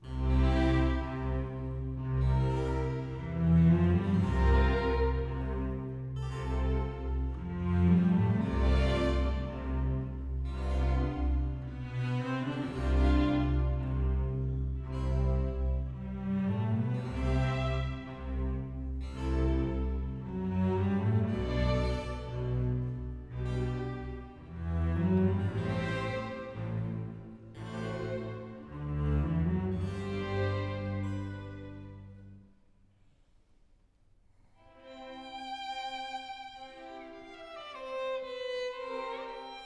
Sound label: Concerto for Violin in a, BWV 1041, Andante; Violin Concerto No. 1 in A minor, BWV 1041; Johann Sebastian Bach; Capt. Michelle A. Rakers, conducting; MGySgt Claudia Chudacoff, soloist